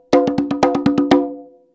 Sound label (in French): frise, congas